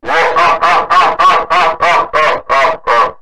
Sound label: laugh